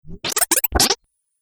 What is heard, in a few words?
Little Bits Of Laser, laser sound effect